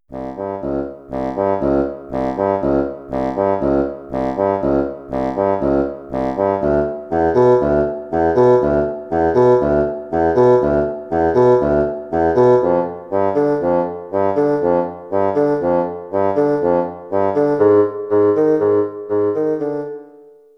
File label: Bassoon; Chorale and Toccata; Basson 1